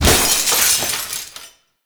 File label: Glass Window Break; Warframe; Nineteen Ninety Nine